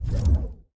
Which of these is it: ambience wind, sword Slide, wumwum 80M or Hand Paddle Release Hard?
Hand Paddle Release Hard